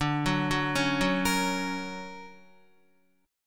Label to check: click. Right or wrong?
Wrong